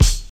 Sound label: 2000s shiny kickdrum c sharp key; kick drum samples